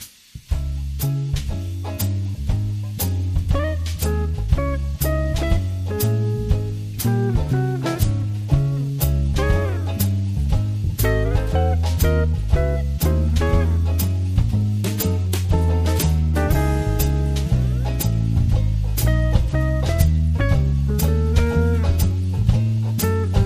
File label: Easy Swing Ding Light Jazz Guitar